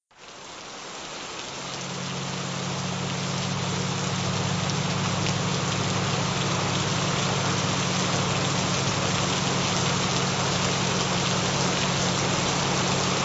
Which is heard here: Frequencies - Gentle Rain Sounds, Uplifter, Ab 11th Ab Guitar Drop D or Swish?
Frequencies - Gentle Rain Sounds